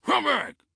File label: Guard Start Run Stumble Come Back; Subway Surfers City; Android, iOS